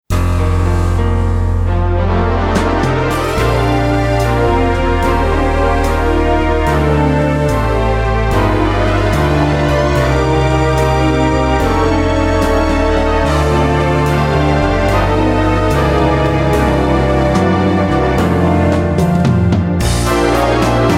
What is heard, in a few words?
Lowrey Strings